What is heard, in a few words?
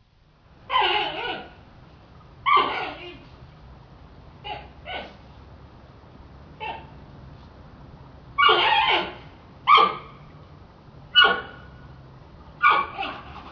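panda golos, panda voice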